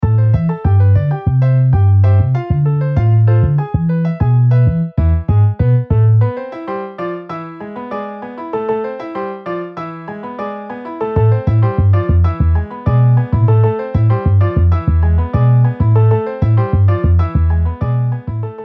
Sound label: Piano Bass MIDI